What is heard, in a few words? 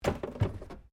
WRECK PANEL IMPACT PANEL LIGHT, Wrecked Cars; Wrecked Cars, impacts, crashes, scrapes; SoundEffects-Foundation4